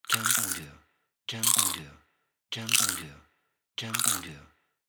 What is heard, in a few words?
Crispy Chips